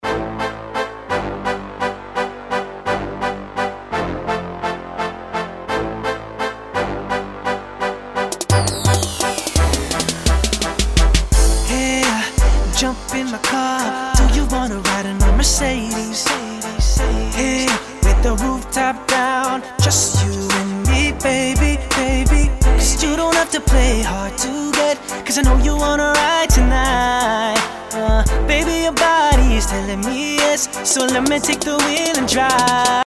mastered pop song

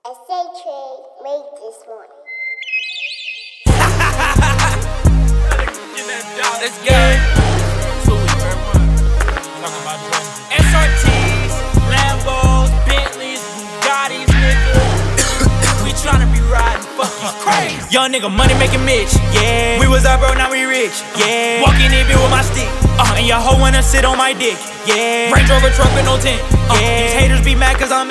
after, hip hop mixing

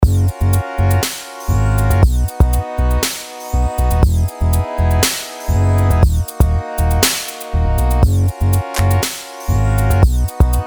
new school mastered, hip hop song

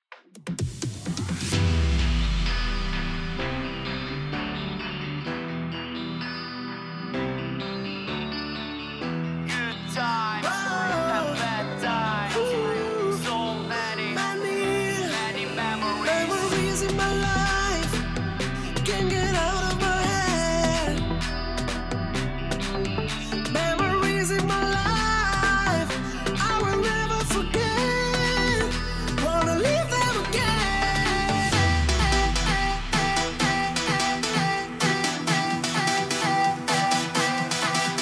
unmastered dance music

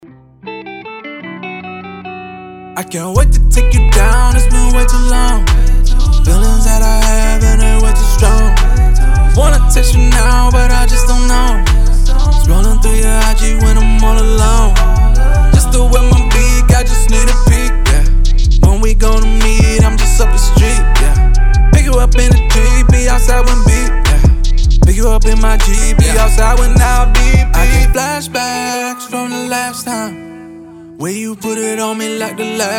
autotune hip hop after; mixing

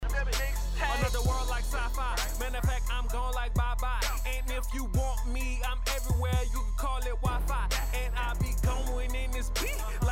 trap unmastered; hip hop

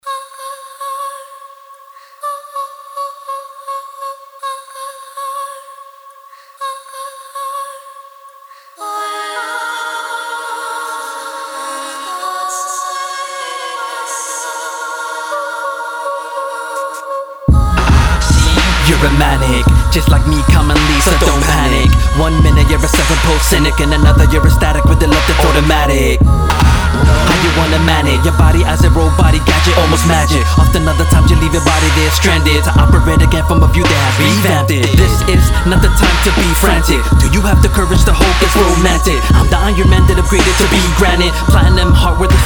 alternative hip hop mix

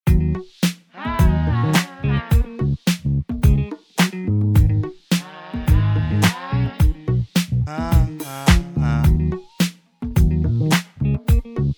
r n b funk mastered

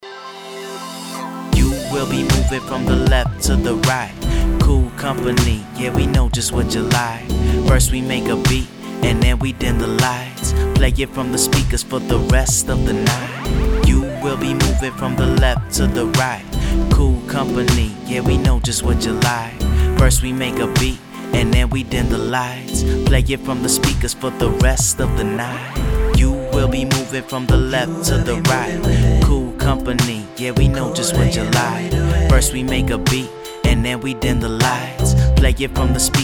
new school rap

